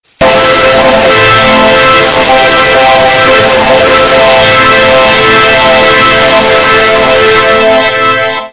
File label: fanfare loud